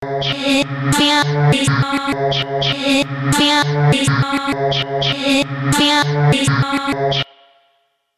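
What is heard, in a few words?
Hip Vocal Electric Dance Loop 100 Bpm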